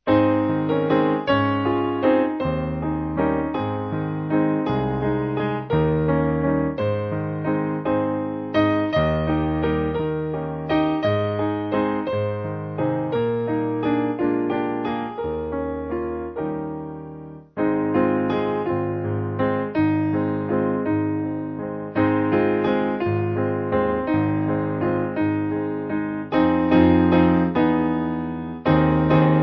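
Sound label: Piano, Music Backing Files for Churches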